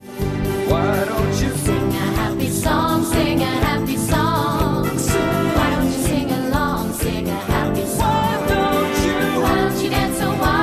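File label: Voices Happy Song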